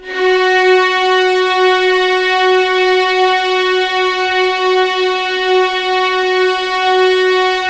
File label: F#4, String Collection; East West; The Ultimate String Collection